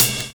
CLEAR BEA; Univers Sons - Kitch 70s

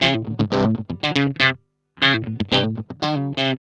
GUI, LICKS; 120 LICKS

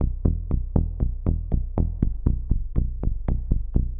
SH101 Bass Loop (120BPM), retro house

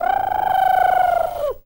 TUV BIRD; Spectrasonics Vocal Planet; Jazz FX

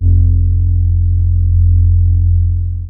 DEEP BASS; Kid Nepro; Elite

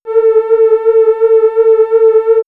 Vacuum Head, ambient synth fx; X-Static Goldmine

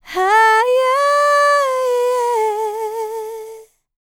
LICKS, Vocal, R&B; ILIO; Dance; LT R+B

